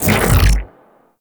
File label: Corpus Arachnoid Camper Shield Pass Through; Warframe